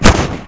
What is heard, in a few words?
parachute; SuperTuxKart